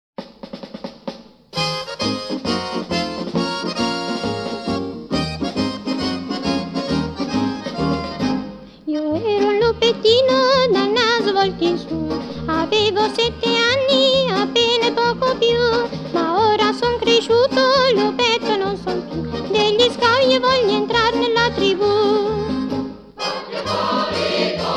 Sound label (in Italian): canzone, canti scout; Versione cantata